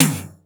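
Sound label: big tambourine; East West - Global Sounds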